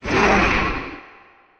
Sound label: injured